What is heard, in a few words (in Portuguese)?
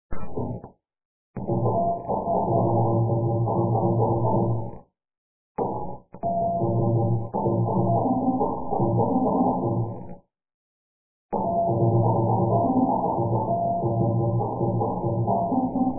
bateria